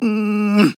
Grunt Jump; Barik

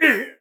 Death; paladins; BombKing

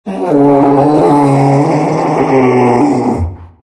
big moans; undying

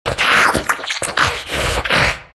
undying lipsmack; Dota 2

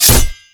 attack; Dota 2; Magnus

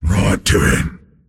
move pain; Pudge

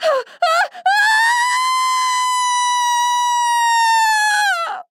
Falling; Princess

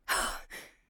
Grunt Slow; Realm Royale; Hunter; Twilight Huntress

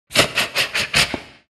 broo laugh; Dota 2; broodmother

